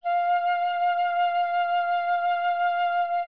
flute C5 pitch Shift F5

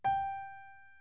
piano G5

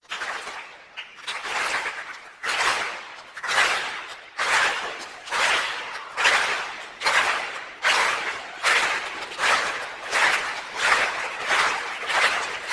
applause

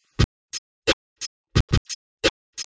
drums alg; dereverb

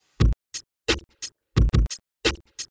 drums oracle; dereverb

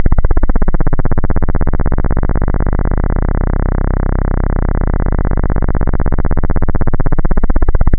risset beats

